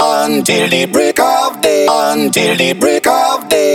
128BPM Brazil Melody; Vengeance Samples; Brazil 2 Kit

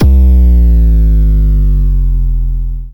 FX Impact; Vengeance Samples

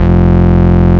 Root F, Bass

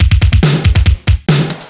Loops BB 140, BreakBeats; Vengeance Samples

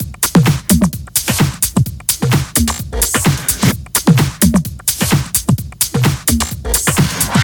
129BPM Kit; Vengeance Samples; Micromax Kit

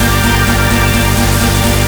VFX2 Loop 128 BPM

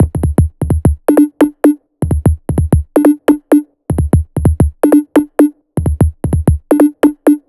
Melody Kits 128BPM Root D; Vengeance Samples

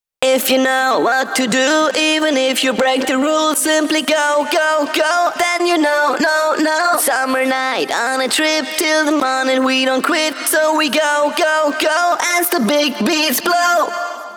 Pitched Vocals WET, 140 BPM; Vengeance Samples; Vocal-Hook Kits; Song Kit; If You Know

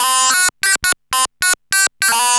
Arp E Line, ARP Odyssey; musicradar-synth-samples

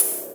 Open Hihat; Vengeance Samples; VEE Cymbals

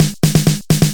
Fill Ins 128BPM; Vengeance Samples

